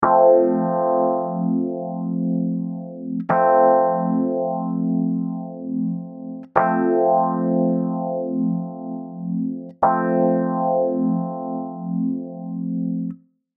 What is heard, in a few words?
Chandler TG 1 keys dry